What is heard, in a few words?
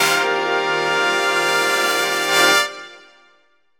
Bugle; super crazy rhythm castle